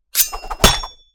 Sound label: suriken; foley walkers